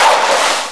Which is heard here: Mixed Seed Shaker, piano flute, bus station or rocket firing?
rocket firing